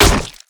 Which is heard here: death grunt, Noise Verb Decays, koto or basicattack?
basicattack